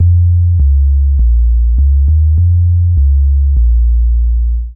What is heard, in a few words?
groove 101 bpm; MIDI Clips; Boom Nation; Ableton; Factory Packs; Beat Tools